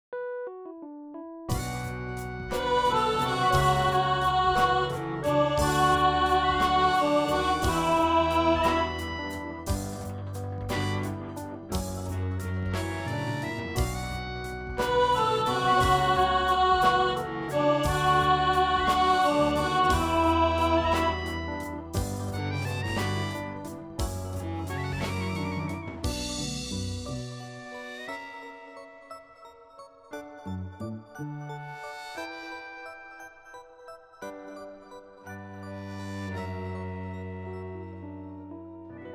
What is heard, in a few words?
Soprano Alto, rehearsal